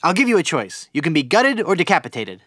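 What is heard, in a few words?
female attack; nwnds audio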